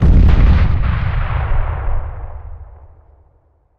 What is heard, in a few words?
explode distant, grenade; hegrenade; weapons; Counter Strike Model Pack; CS:GO